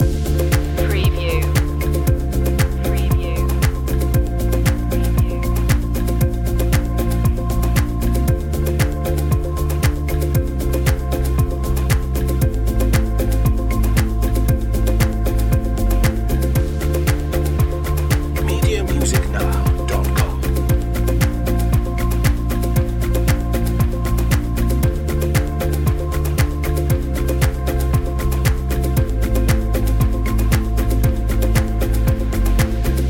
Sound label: heading back no guitar no piano